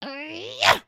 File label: knockdown; creatures organic